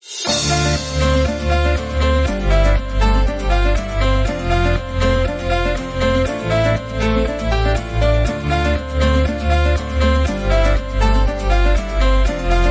Funny dance tropical house bright dancing background music